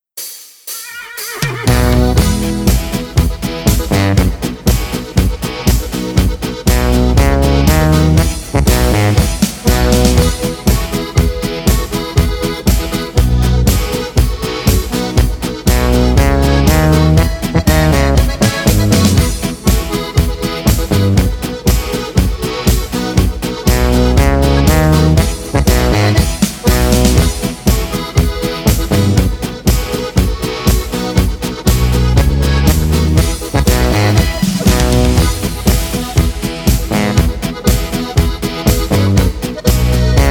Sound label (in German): ohne CHOR